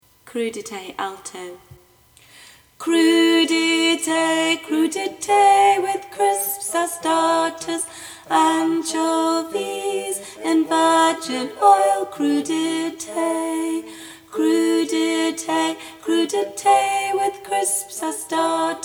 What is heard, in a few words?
Crudites Alto